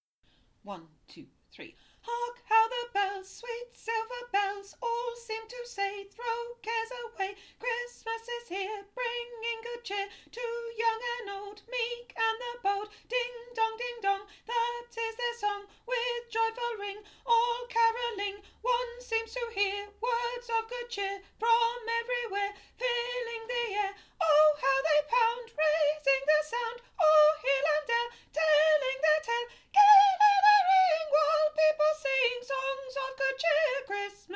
CAROL OF THE BELLS SOPRANO

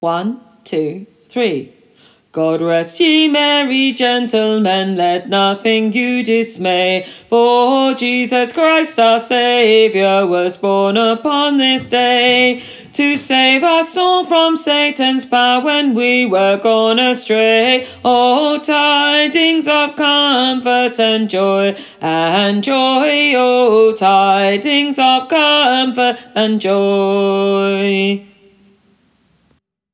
Tenor, God Rest Ye Merry Gentlemen

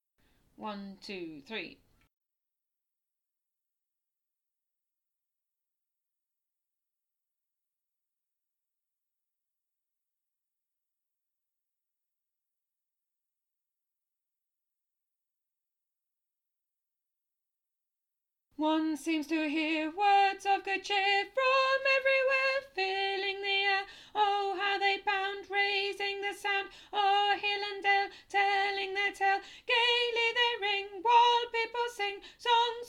CAROL OF THE BELLS BASS

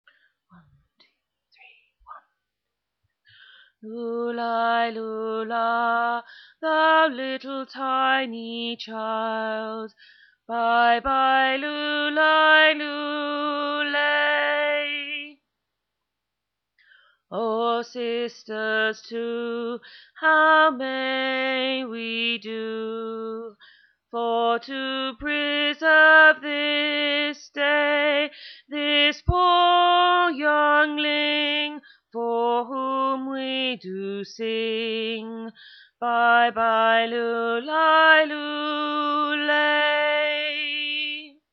Tenor Coventry Carol

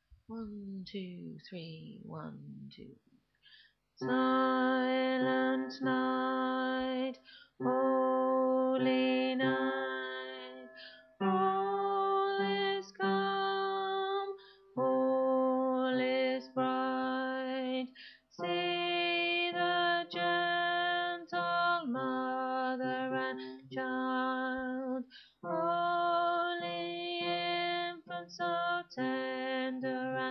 Bass, Silent Night

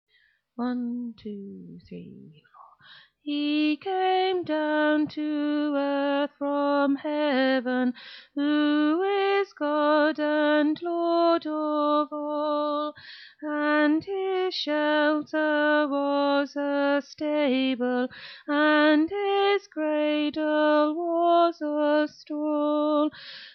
Alto Once in Royal

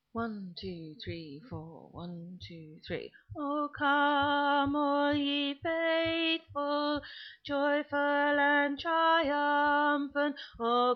Alto O Come All Ye Faithful

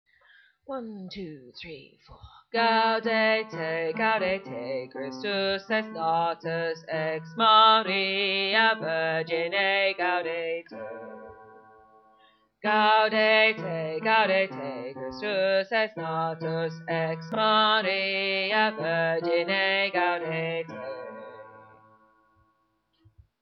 Bass, Gaudete

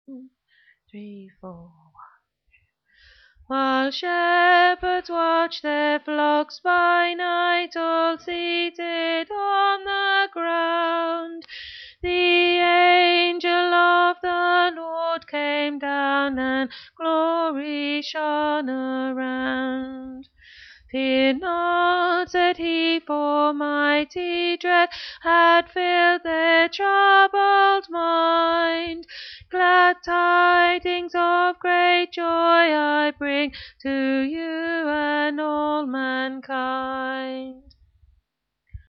Alto; While Shepherds Watched

